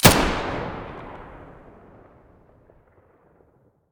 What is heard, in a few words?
muzzle stereo